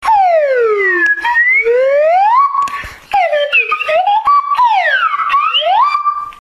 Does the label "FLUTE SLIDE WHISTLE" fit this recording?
Yes